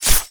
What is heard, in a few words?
metal claw